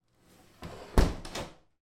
household window bathroom close

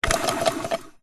leisure toy plastic spinning drum spin weak

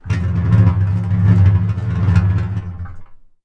sound design effect metal object spin drum medium; sound effects one

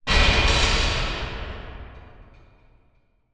sound design big horror hit metallic cinematic

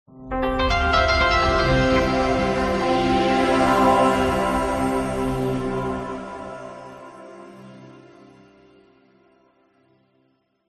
logo ident warm tranquil calm

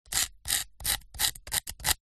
rock knife tip scrape scratch; foley

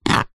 foley plastic sharp object single scratch; zapsplat

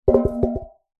tin metal cylinder lid on knock over on carpet; foley; zapsplat

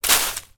foley leaves plant dead dry kick; zapsplat

